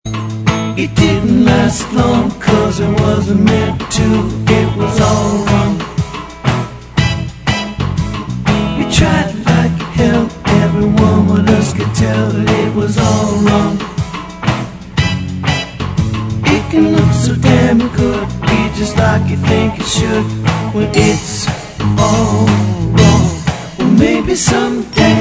Reggae 120

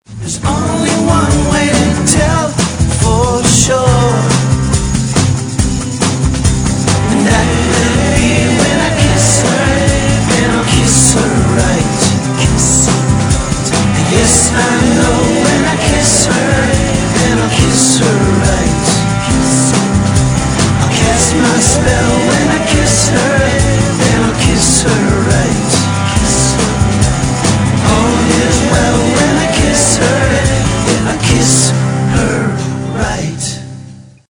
Rock Version T140

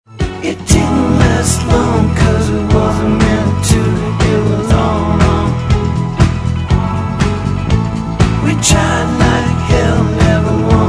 Disco

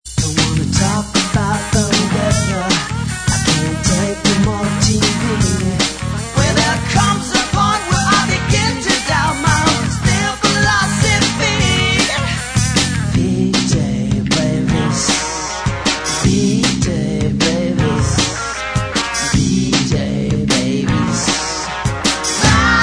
Heavy; Gigland Records

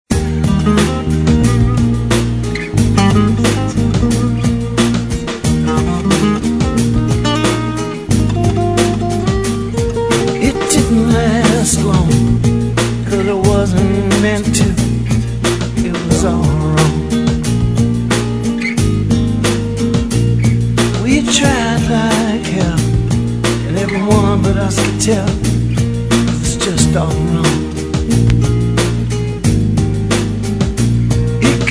Ballad AC Only

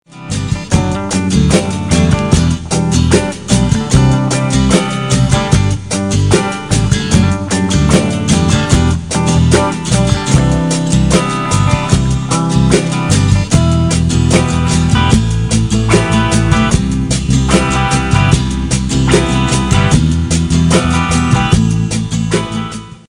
AC Half X Mix T150

